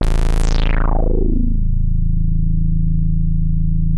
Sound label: A1, Bass; E-MU Producer Series Vol. 6 – Analogia Project (CD 1); Boom da Bass 2